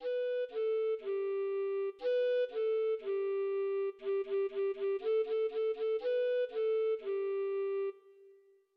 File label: Recorder Hot Cross Buns